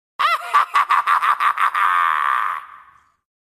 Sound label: evil laugh